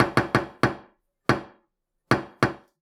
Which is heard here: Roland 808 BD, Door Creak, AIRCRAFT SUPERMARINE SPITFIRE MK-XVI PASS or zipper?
Door Creak